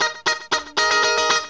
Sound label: GTR LICK; E-MU Formula 4000 Series Vol. 1; Hip Hop Nation